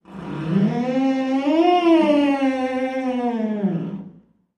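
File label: door creak small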